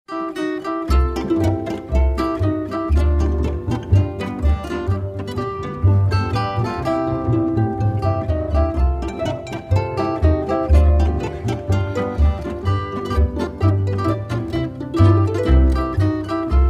Saitenmusik Pinzgauer Polkerl

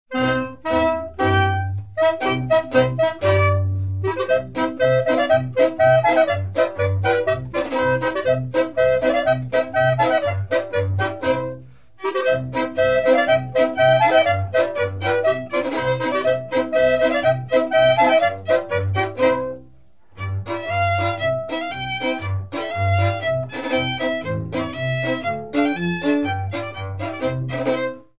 Drum Gschwind Marsch

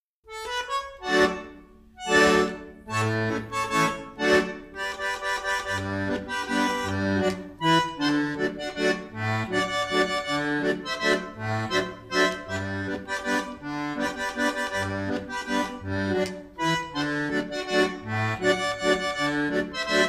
Volksmusik Chiemgau Kirtaglandler

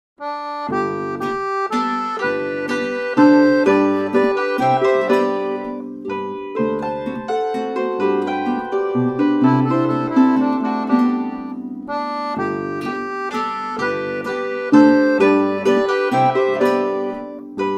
Spielmusik Zwei Menuette

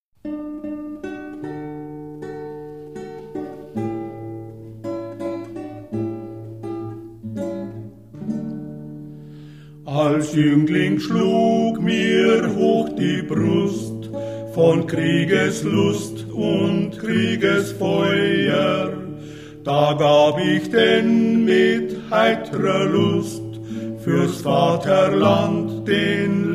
Volksmusik Chiemgau

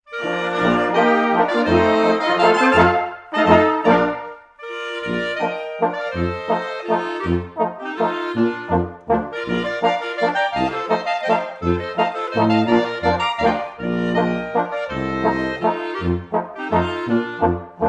Ohne Worte Klarinettenhalbwalzer

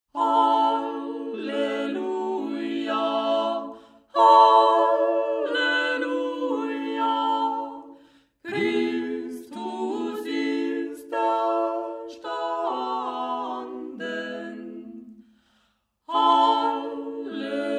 Halleluja

